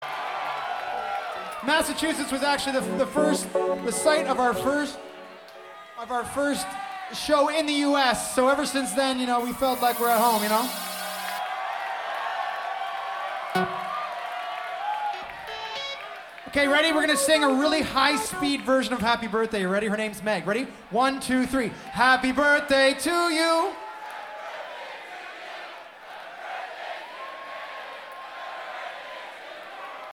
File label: Applause